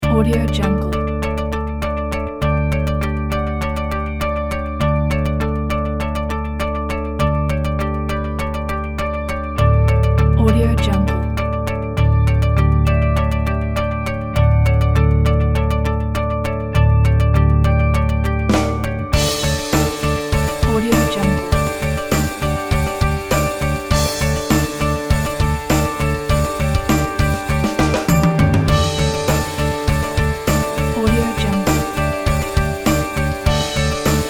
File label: Harmonics